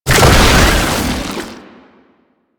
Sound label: Stun Start, Foley; Enemies; Warframe; Proto Infested; Babau